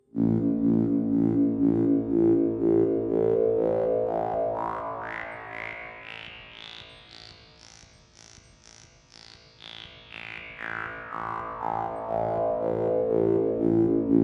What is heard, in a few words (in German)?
filterspiel, Trautonium